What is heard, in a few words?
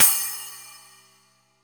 long room reverb crash cymbal single shot c sharp key